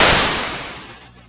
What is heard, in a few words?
C64 Explosion; 8-Bit; Chiptune; Commodore 64; FX